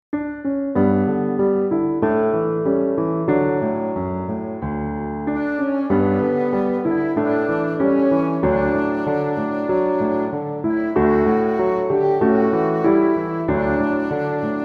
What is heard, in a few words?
Hail Mary instrumental